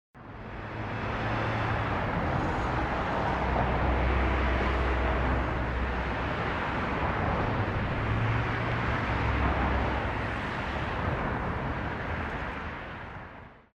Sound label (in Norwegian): Rushtrafikk